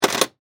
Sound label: Steampunk Button, Scifi, Steampunk, Button, Switch; Science, Fiction; BlastWave